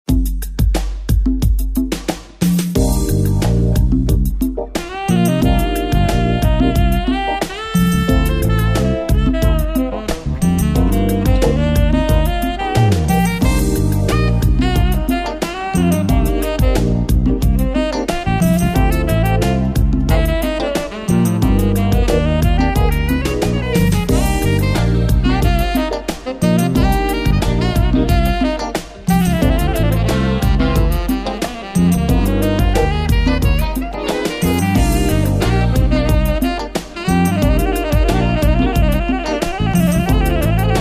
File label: smooth jazz sax